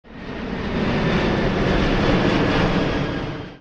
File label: plane